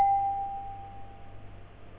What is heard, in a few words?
glass